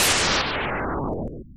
thunder hit; mother2

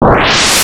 launch; Rocket Launch; mother2

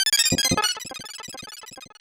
Flash; mother2

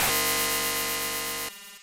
Fired a beam; mother2

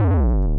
Mortal damage; mother2

